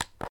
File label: Click